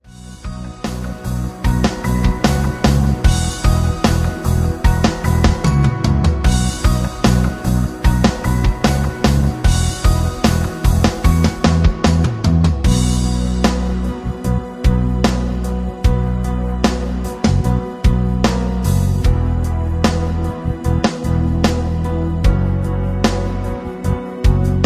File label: rhythm